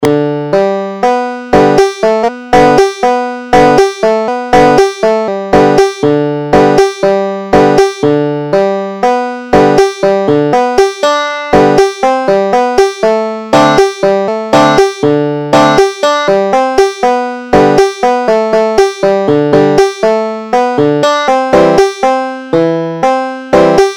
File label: red river valley, banjo